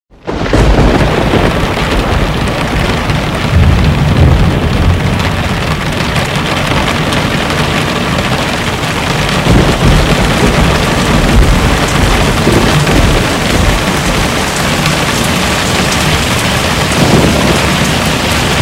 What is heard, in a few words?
hail; plague